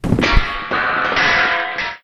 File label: suit fall; Apotheorasis